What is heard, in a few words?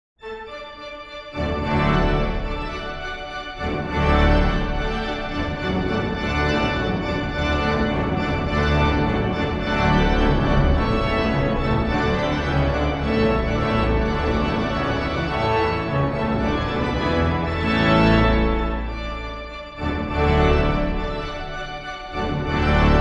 La Rejouissance from 'Music for the Royal Fireworks'; GF Handel